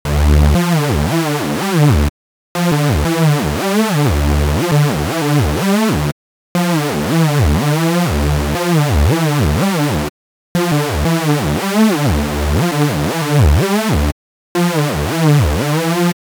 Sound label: saw synth